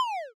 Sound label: zap